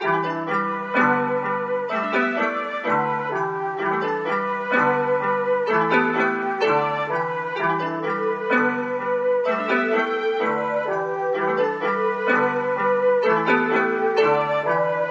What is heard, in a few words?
flute, Jazzmatazz loops